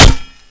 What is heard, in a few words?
sledgehammer hit body